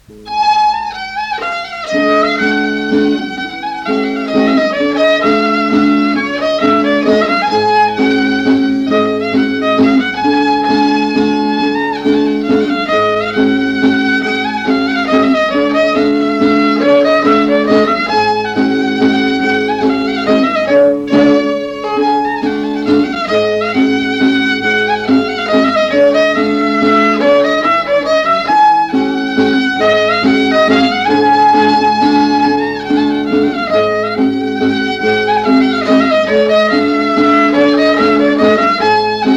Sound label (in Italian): mazurka chitarra e violino